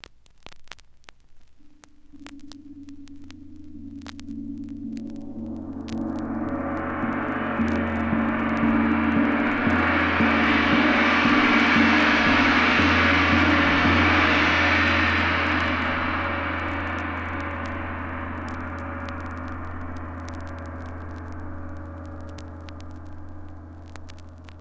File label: Gong Repeated Crescendo